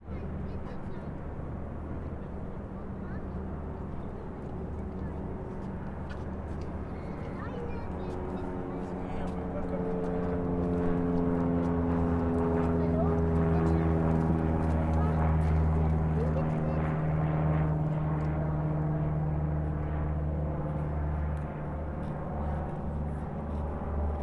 avion

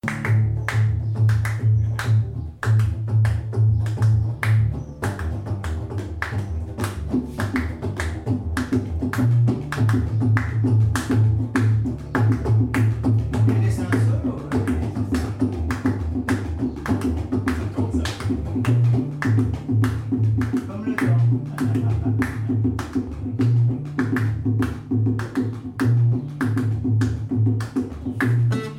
riff marocain

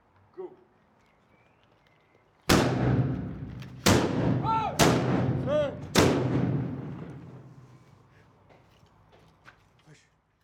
arquebusiers

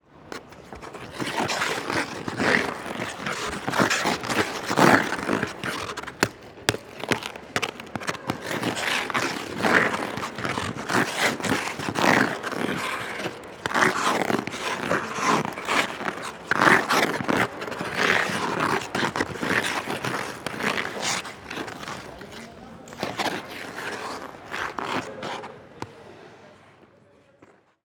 danse glace

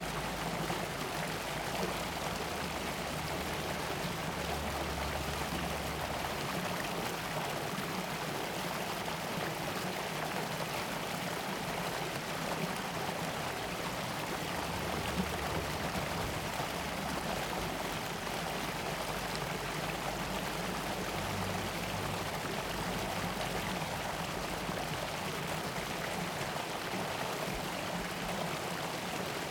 cascade

